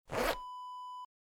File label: jeans zipper